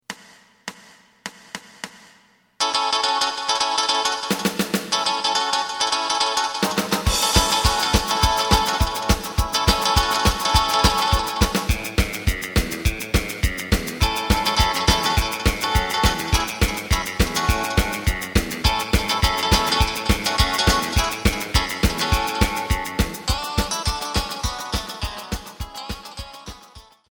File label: bass